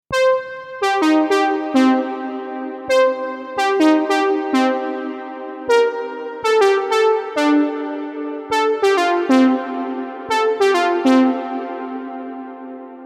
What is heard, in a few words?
Lead